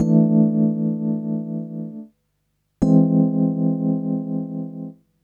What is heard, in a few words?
Rhodes